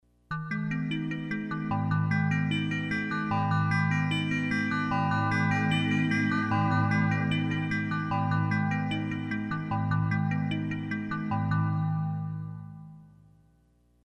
Bells; Kris Rhen Presets

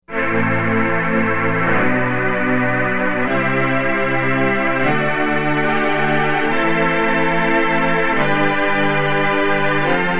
STRINGS Taped Strings

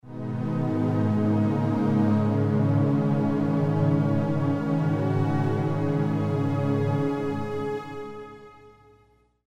Warm Strings; Kris Rhen Presets

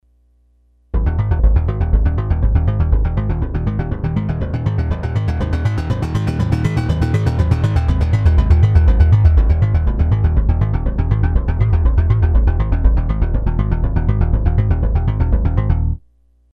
Metabass; Kris Rhen Presets

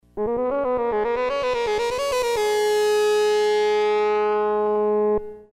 FM Mod Lead; Kris Rhen Presets

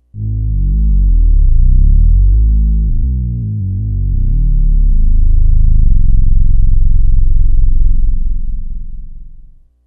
Deep Abyss Bass; Kris Rhen Presets

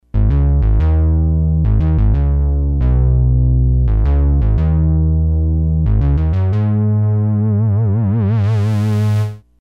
Bass; Kris Rhen Presets

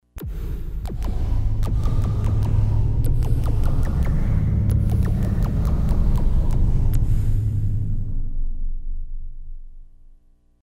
Percubation; Kris Rhen Presets

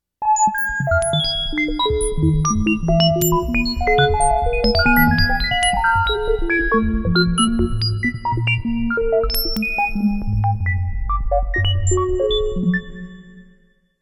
Electronic Music

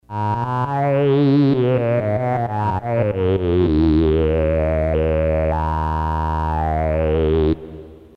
Wheel Down; Kris Rhen Presets

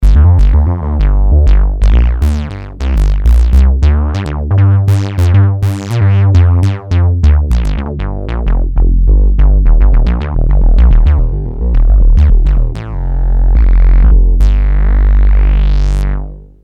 Rubber Bass

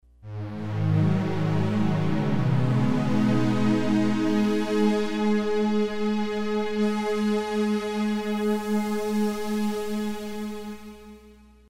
Matrix Pad; Kris Rhen Presets

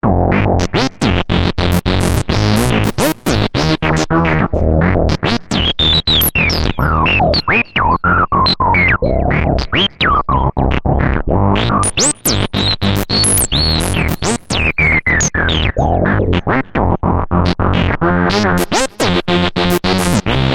SEQ Acid Is Stupid

